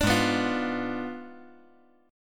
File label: Ab Diminished 7th Ab, 5G, 4C, 5E, 5A; Abdim7 strummed; JGuitar